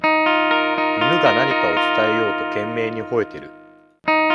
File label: noisy